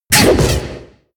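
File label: lesser attack, monster; Senillinea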